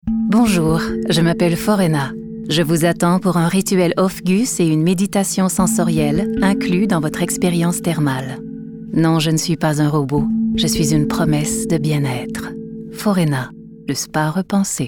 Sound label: handpan; ROBOT